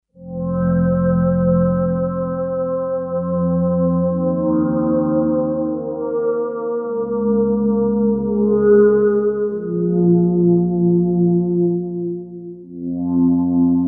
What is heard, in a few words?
Kawai K5000S